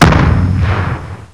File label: Bomb Explosion; Koji the Frog 2.0.1